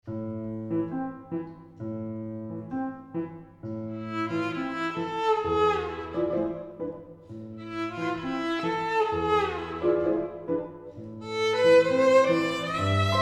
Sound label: Développés